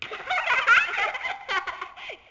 Intro Laugh; Levels Hub Restaurant; Intro Laughter Kid

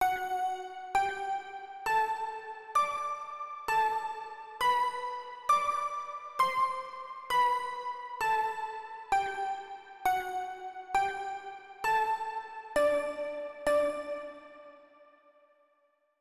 Pluck Melody; super crush k.o.

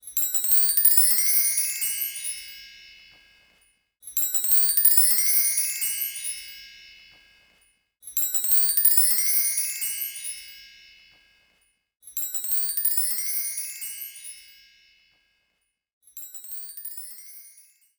Unlocked; Monkey Mischief Party Time; Wii

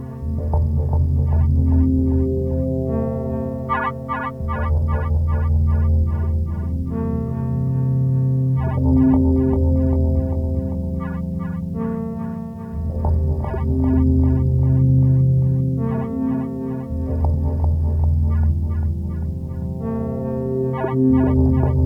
Short Tunnels; boneworks vr windows gamerip 2019

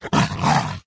Coyote Attack

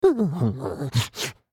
Zombie Mourn

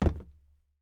plastic land; Teardown

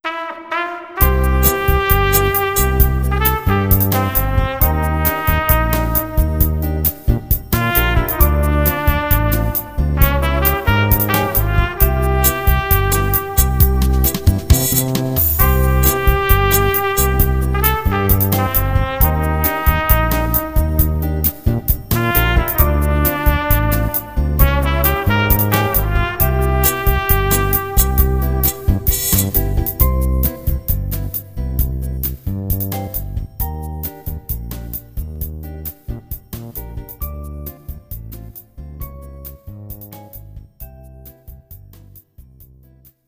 elevator music; Conker: Live and Reloaded

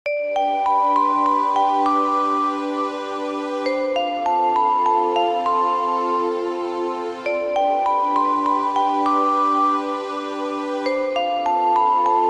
Village Studio Bgm

